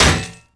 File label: cleaver hit world; tf2